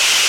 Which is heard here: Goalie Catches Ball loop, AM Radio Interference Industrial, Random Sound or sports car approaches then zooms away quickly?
Goalie Catches Ball loop